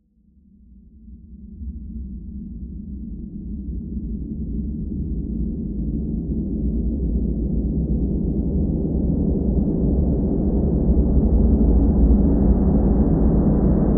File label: Low End Rise